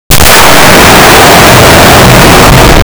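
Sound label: launching element, projectile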